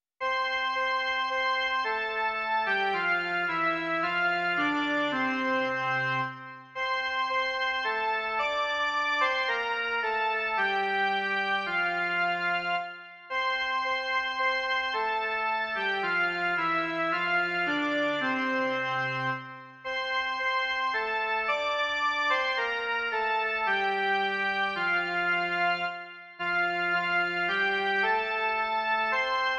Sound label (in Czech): jen melodie; Hospodin sám národů Bůh